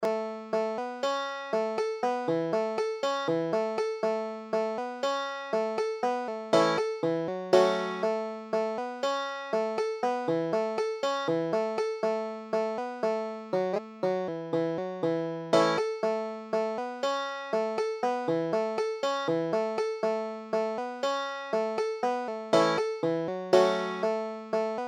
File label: britches full of stitches, banjo